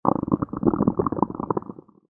human stomach gurgle

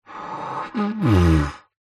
human air rasp blow into card tube; zapsplat

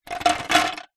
ice cubes movement slide in plastic tray; food drink

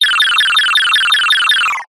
game retro laser repeat level complete

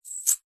industrial chain small catch hand

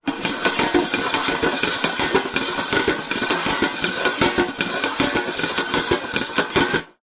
foley metal tray lid rotate turn spin on metal

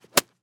Golf Club Swing; Blastwave FX

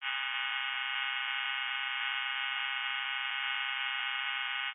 technology cb radio interference burst receiver; zapsplat

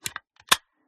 Battery Insert Charger; Eneloop; PMSFX

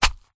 foley clothing wet drop squelch

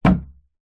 impacts plastic hollow; zapsplat

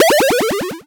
game lose negative

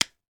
toy baby toy wooden puzzle pieces hit together

